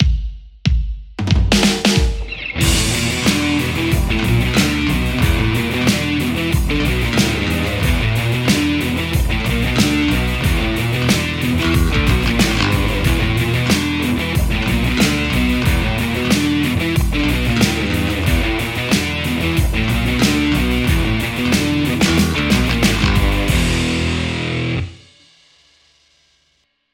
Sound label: Les Paul; scuffham sgear2